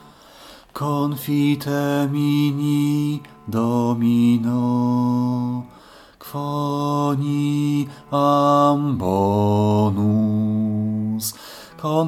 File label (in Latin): Confitemini Domino bas; Taize